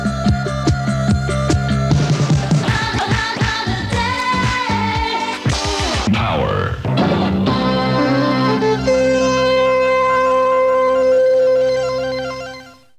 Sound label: jingle holiday